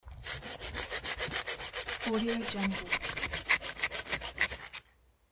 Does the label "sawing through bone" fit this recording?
Yes